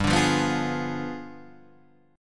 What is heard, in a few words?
D Diminished 7th G